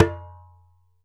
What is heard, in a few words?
ASHIKO, African Perc